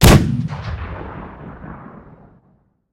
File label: aa fire, guns